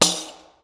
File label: TAMBOURI.HIT; Best Service; World Colours